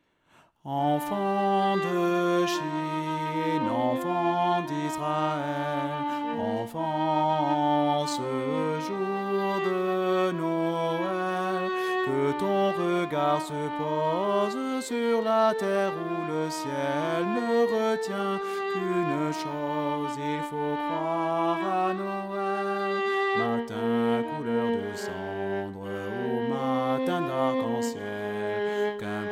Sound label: alto